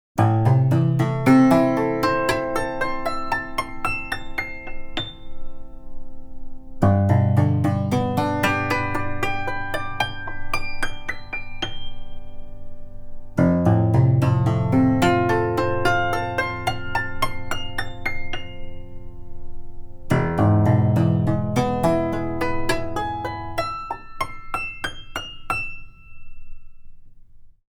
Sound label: Cimbalom